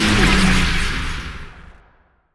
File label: monster ghost elf death